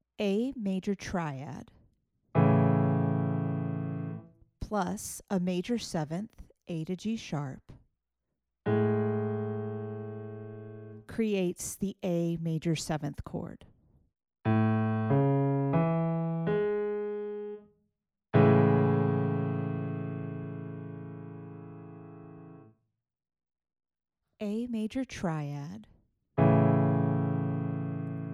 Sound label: seventh chords